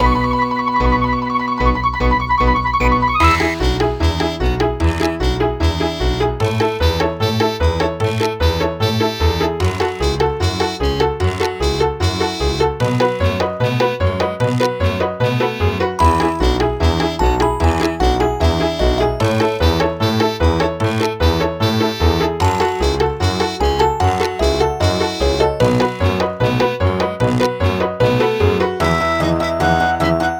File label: Stadium Race; Goofy Speedway; Corkscrew Coliseum & Screwball Stadium; Toontown Online